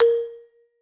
marimba; ゆめ2っき

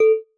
marimba glasses; ゆめ2っき

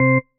marimba tissue; ゆめ2っき

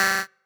marimba bike; ゆめ2っき

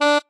marimba bat; ゆめ2っき

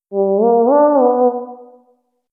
fish talk

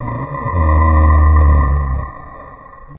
monster; ゆめ2っき

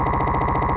glitch; ゆめ2っき

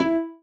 marimba rainbow; ゆめ2っき

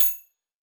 marimba; ゆめ2っき

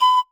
marimba child; ゆめ2っき

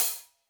marimba drum; ゆめ2っき

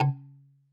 marimba kalimba; ゆめ2っき

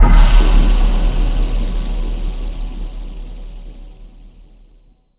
chime

